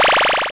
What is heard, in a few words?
Magic; glitch in the system